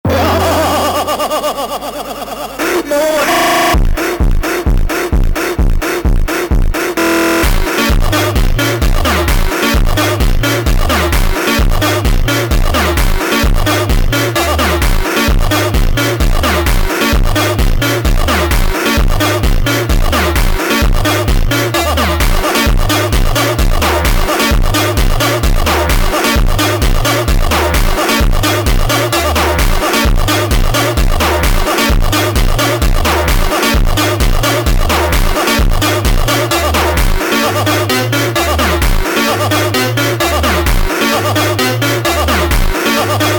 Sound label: Funk Rush Laugh, phonk